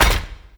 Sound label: Melee Step; Warframe; Necramech